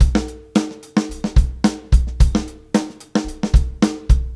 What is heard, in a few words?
Live Beats, R&B Drums; Sonic Foundry (Sony Creative Software)